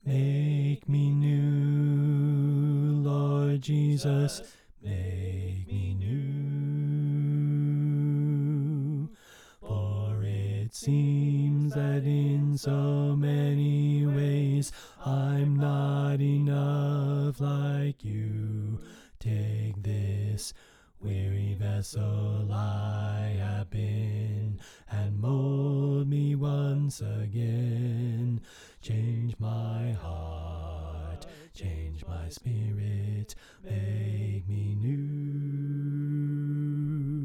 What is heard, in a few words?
Bass Left